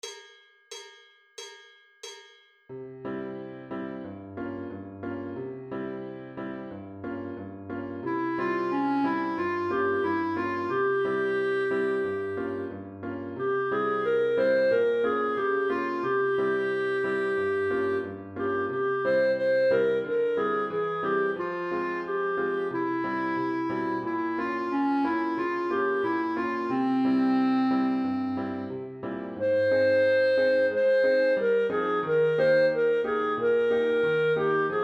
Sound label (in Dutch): Zemer Atik met klarinet